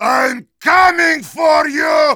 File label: yell; Heavy